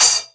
dry open hi hat b key, Royality free open hat one shot tuned to the B note. Loudest frequency: 8131Hz